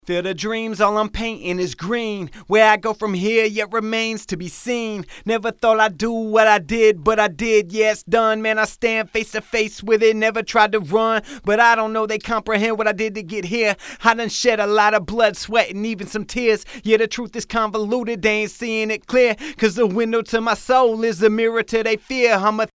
Rap Vox dry acapella

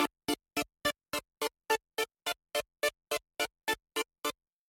chord sequence audio

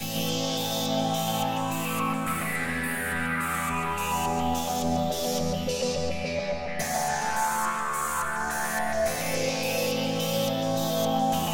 chord sequence audio

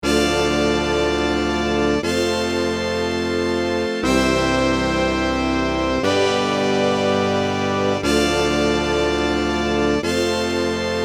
general MIDI horn

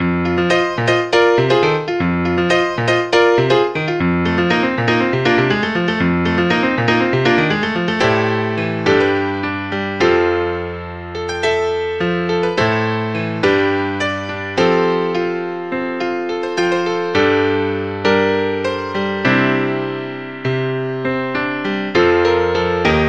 piano riffs

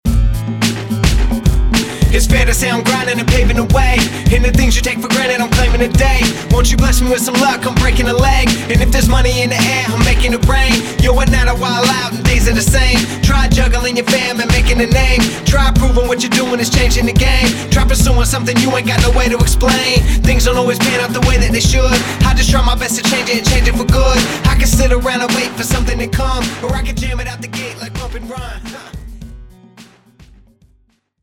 octave hi rap

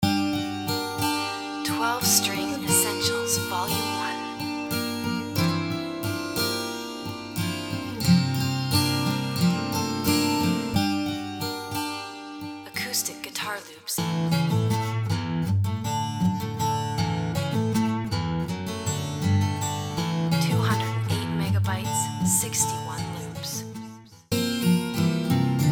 12 string essentials

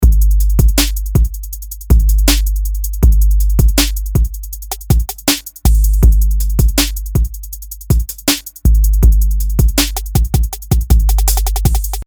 drum loop

